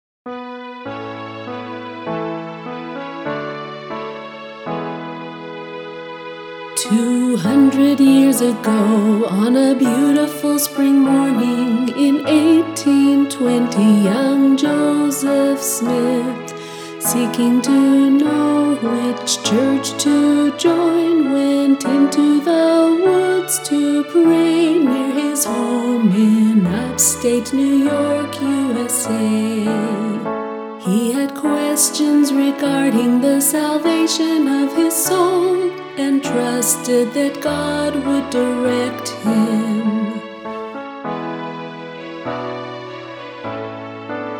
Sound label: Vocal